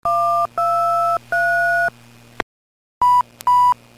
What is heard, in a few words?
disttones; wisrail